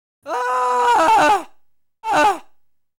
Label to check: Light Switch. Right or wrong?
Right